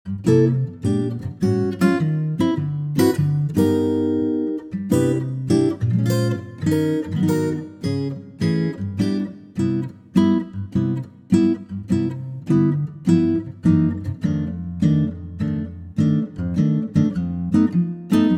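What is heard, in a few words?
Acoustic Guitar C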